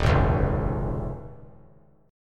D sharp 7th Suspended 2nd Suspended 4th D sharp; strummed; 3,3,4,3; 3C,3F,3A,3D; D#7sus2sus4; JGuitar

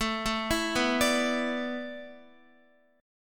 A Suspended 2nd Suspended 4th, Guitar; Asus2sus4; arpeggiated; x,12,9,9,11,10

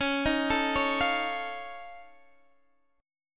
F Augmented 7th Db, chord

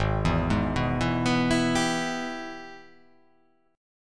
Gsharp Minor Major 7th Sharp 5th

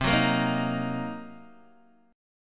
C Minor 7th Flat 5th C down Guitar DADGAD

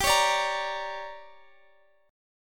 D Major 7th Suspended 4th Sharp 5th, chord

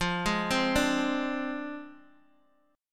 F Minor 6th Guitar DADGAD, chord

